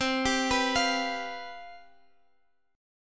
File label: C Major 7th Suspended 4th, Guitar, Half Step Down